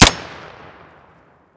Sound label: mp40 single